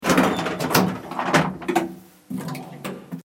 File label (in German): Fahrstuhl Falttuer oeffnet